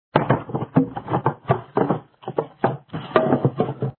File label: power Up Roll Down; lantern jack